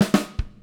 120 CARIBE; Sampleheads; Peter Erskine Living Drums

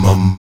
VOX MUM F#2B, Afro Chants, Stacc Mums; Roland LCDP11 Africa VOL-1

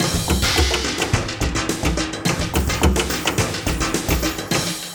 116, beat; AKAI S6000 CD-ROM - Volume 1

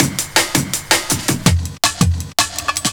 Break, Jungle; Mutant; Breaks

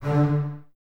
Basses D 3, String Ensembles; Miroslav Vitous; LINKS ORCH

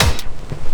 Drum & Bass, 160 BPM

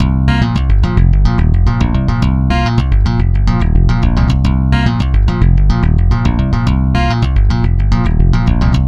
Stick Loop, Stick Bass; Sonic Foundry (Sony Creative Software); Leo Cavallo Bass Taster

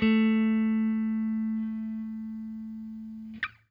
FENDER SFT, Guitar; Quantum Leap

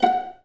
VA PIZZ F#4; Best Service; Peter Siedlaczek's Advanced Orchestra

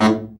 BRA Bari, SAX; Best Service Gigapack 1; BR SAX 2

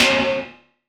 Snare, Gated Reverb; creative gate; musicradar

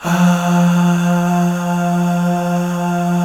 F2 MALE AH; Northstar - New Gold VOL-2

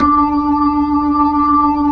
B-3, Jazz

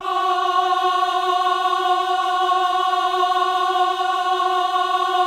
VIB F#4, VIBRATO, Voices; Spectrasonics

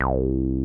TB303; Zero G; Man Machine

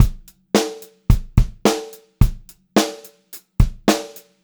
Pop-Rock Drumloops 108 POP BEAT; USB Soundscan vol.37; AKAI

